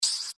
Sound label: giant rat injured; npc